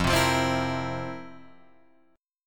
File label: F Major 7th Suspended 4th, Guitar, Drop D